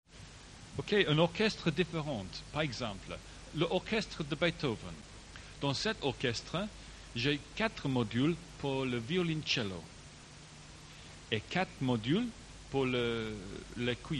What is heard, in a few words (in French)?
FM Yamaha violons cuivres; modules TX-816, en 1986 ou 1987; Cette fois les violons sont en nappes, les cuivres sont ajoutés à la pédale de volume; Démos de David Bristow; 5e Symph Beethoven